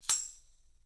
TAMSHA, EFFECT CYMB, 3D Drums; 3D Sound

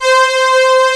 FM ST SYNTH

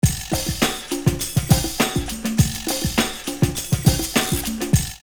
Knock D Conga 102, drumloops OldSchool; X-Static Goldmine

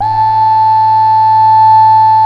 BEGIN LOOP, Synth and Organ

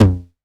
909, DIST; Wizoo - Platinum 24 Electronic; 909 DIST P1

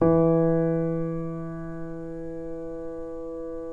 PNO PP; Synclavier Sampler Library

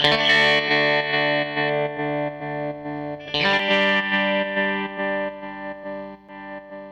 Strat Guitar Loop; Sonic Foundry (Sony Creative Software) - Six-String Orchestra

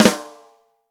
ROCK SNARE CHROMATIC, Real Drum Kits

